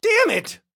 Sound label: police hit bad short; donnie; Blind Drive